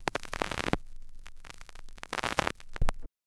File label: a highly detailed sound e, vinyl